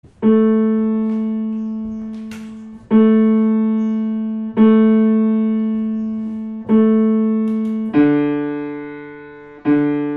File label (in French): accordage